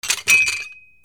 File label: KITCHEN